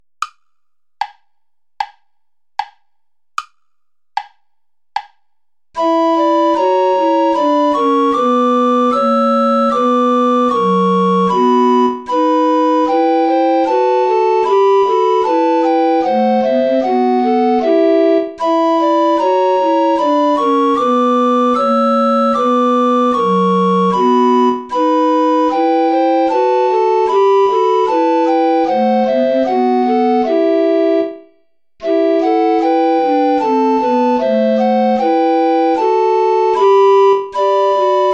christ lag todes banden play along without alto recorder; bach johann sebastian